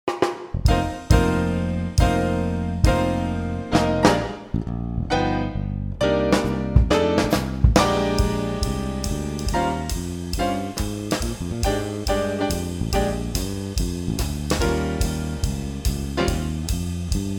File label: Bb Blues Improv Etude; mono